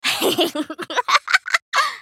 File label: laugh; Annie